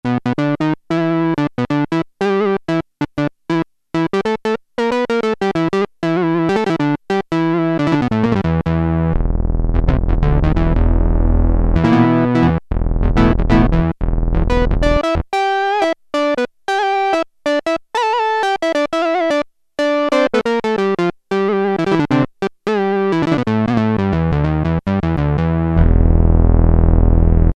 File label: Lead II, Roland Juno-106; Factory Preset